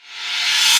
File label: Reverse Crash, Cymbals; Vengeance Samples